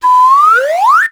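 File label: SLIDE WHISTLE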